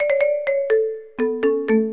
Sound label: win; bearwarecd; ProBack